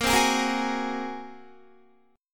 Bb 7th Suspended 2nd Sharp 5th Bb, Guitar; Bb7sus2#5; strummed; JGuitar

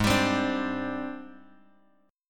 Ab Major Sharp 11th Ab down Bass Standard; AbM#11 strummed; JGuitar

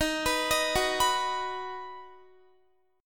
Eb Minor Sharp 5th Guitar; Ebm#5 arpeggiated

